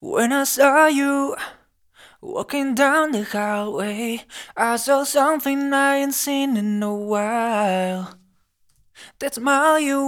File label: Pop EDM Dance 108 DbM